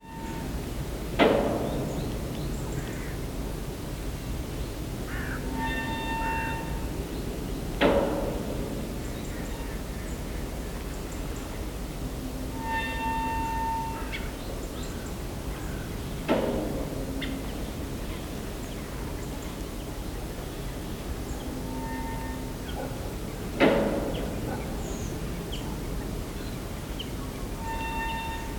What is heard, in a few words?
windpump